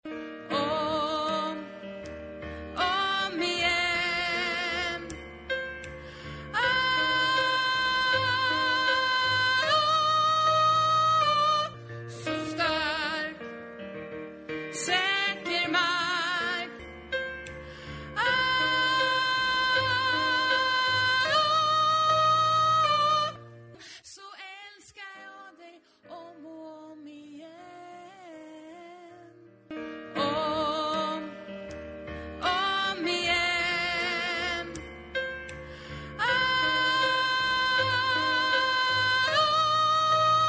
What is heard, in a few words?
tenor